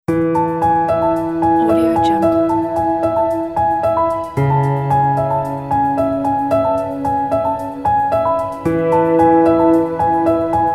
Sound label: sweet ambient corporate